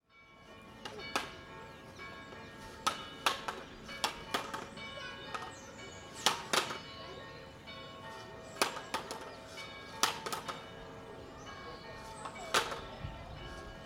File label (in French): Cloche